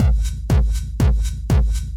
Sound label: Dirty Beats